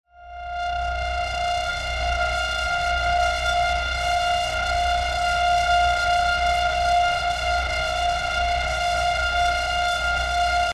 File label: drone; betrayer